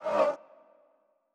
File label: Chant